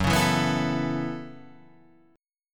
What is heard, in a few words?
F sharp Minor 11th, Guitar, Half Step Down